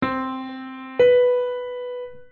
octava disminuida ascendente; intervalos; musica; melodia; octavas

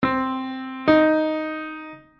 tercera menor ascendente; intervalos; musica; melodia; terceras

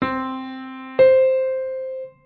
octava justa ascendente; intervalos; musica; melodia; octavas

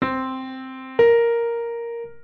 septima menor ascendente; melodia; septimas; aprende intervals

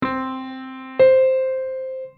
septima aumentada ascendente; melodia; septimas; aprende intervals

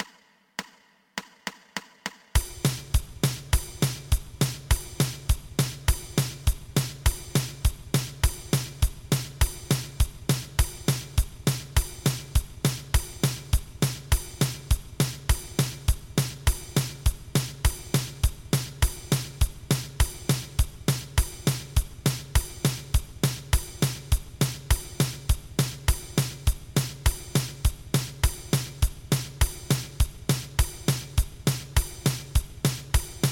2 pulsos

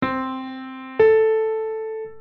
septima disminuida ascendente; melodia; septimas; aprende intervals

